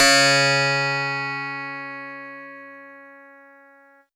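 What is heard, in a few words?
Harpsichord DX100 C sharp 6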